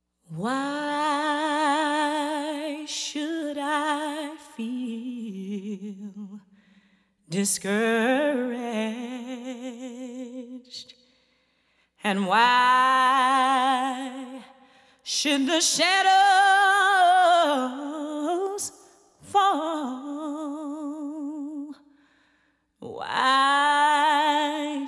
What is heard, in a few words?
Gospel